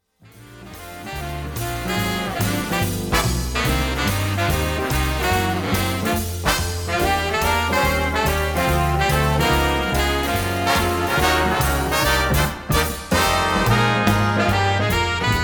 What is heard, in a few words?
sax